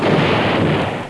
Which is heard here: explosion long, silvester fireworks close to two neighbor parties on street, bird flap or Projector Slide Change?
explosion long